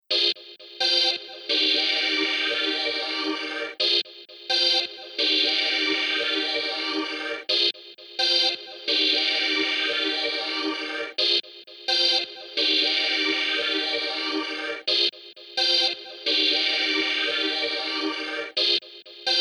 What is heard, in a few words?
only melody